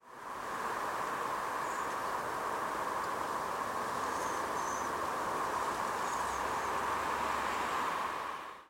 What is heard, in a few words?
blackbird single double and triple calls